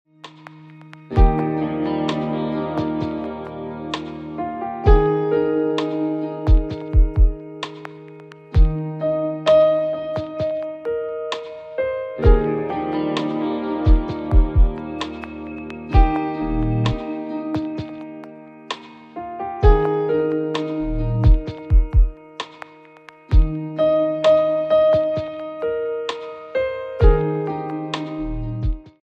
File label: sad piano ringtone